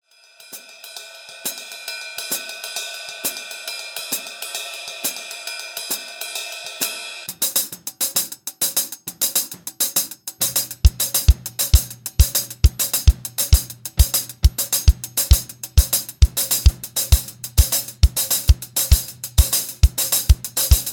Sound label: Dreamscape Drum Track